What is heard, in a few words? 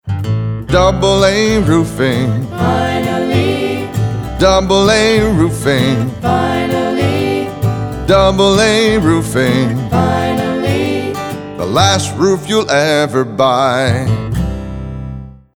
Roofing Jingle; Double A Roofing